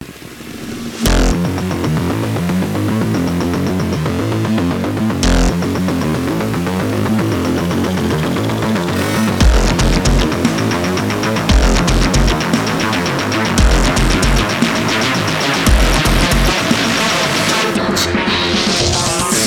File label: instrumental version